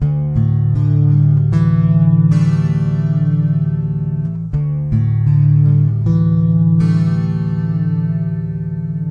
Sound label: mellow guitar loop brightfinish